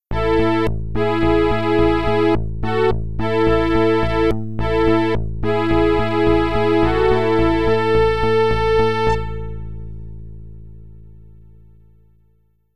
Stage Clear, MT-32; Raiden

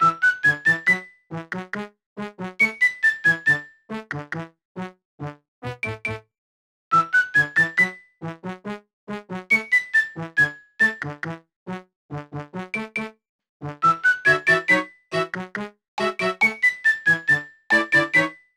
Mus Gameplay; Wonder Pets Save the Animals 2008 NDS gamerip; Schoolhouse

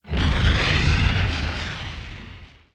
lava burst; ice age scrats nutty adventure; Level4 Hidden World

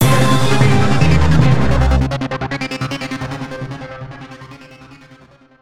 Stinger

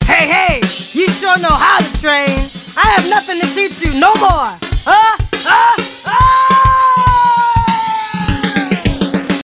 Entering Cool Mode

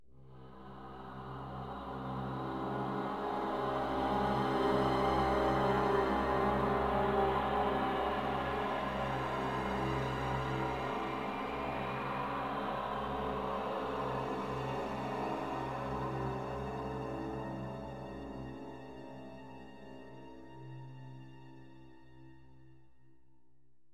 Riser; N.O.V.A. 3 Near Orbit Vanguard Alliance

